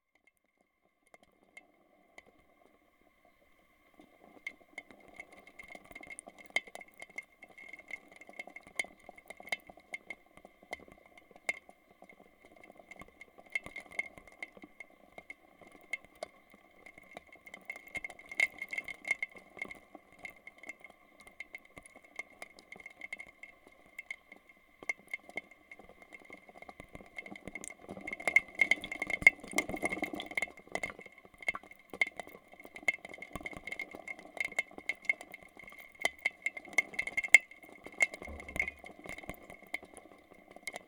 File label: Rain And Birds By Hydrophone Edinburgh